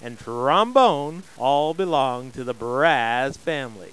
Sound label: BRASS